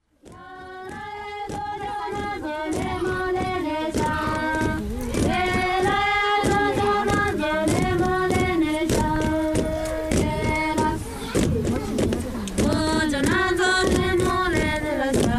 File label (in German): Arbeitsgesaenge; Samye